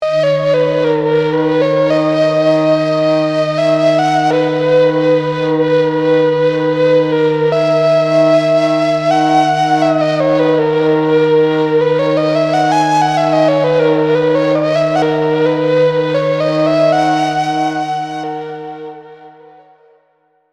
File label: Flute